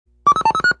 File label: 5 Tone, BEEP; noisetoys